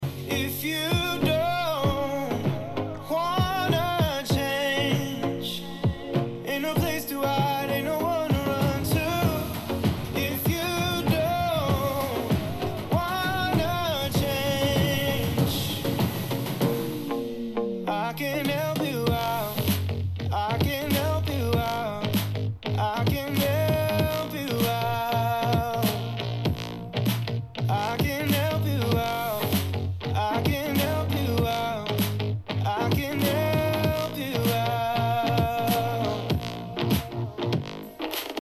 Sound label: bass